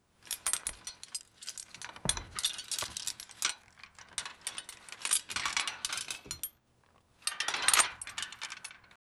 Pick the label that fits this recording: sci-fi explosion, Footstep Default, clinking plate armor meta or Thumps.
clinking plate armor meta